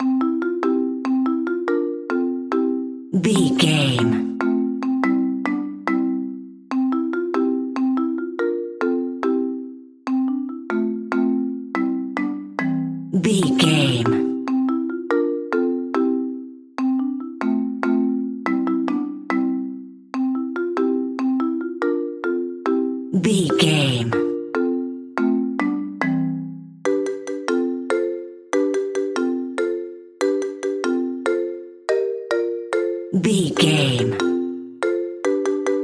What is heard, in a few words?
hap hap clappy mallets only